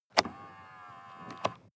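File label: sports car interior side mirror adjust short; verkehr transport; autos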